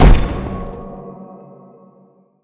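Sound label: spell restoration hit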